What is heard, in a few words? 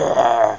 human hit; siberian journey